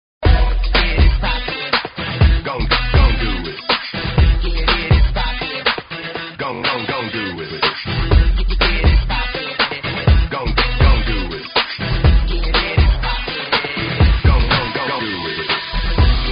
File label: funk; multitrax